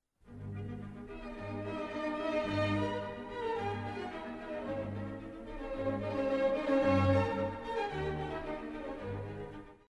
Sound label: Mozart G minor symphony